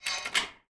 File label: Oven Lava Open; Game rip; MiSide